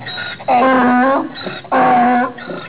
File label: donkey